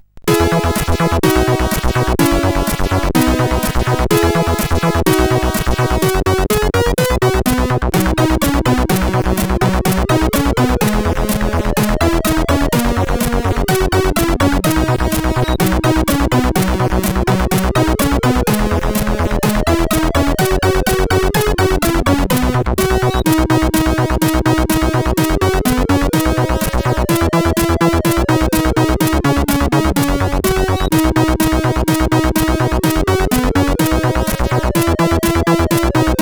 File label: Jingle Bells